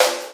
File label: snarelike; Sonic Foundry (Sony Creative Software); Ugly Remnants VOL-2; Beatstuff